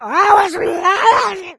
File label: rage at victim, infected female